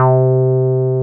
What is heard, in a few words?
303 SQR C4 F, SQUARE BS